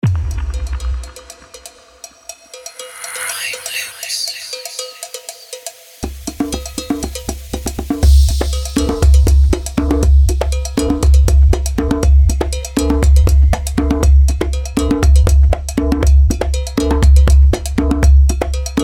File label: nigerian percussion; prime loops; soundbites